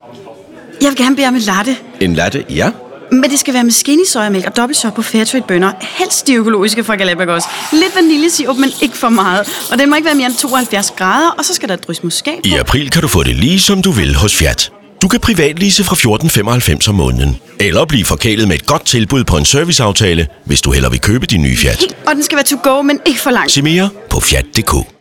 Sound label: Radio Mix